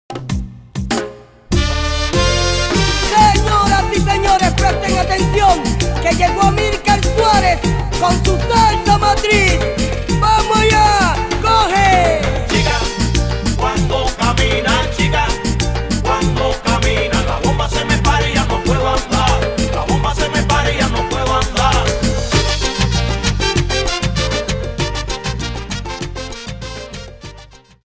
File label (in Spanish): regguetton timba